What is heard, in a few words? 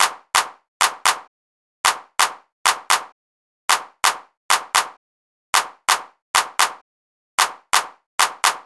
BEAT